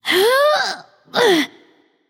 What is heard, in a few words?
grunt lift light; smite; voicepack; hel